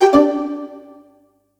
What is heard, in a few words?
opened Widget